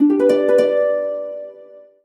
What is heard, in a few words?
Solo Harp Positive